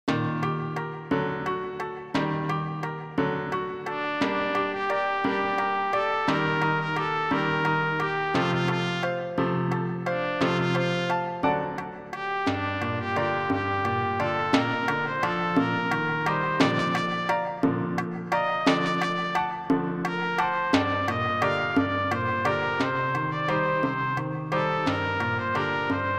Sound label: slowest click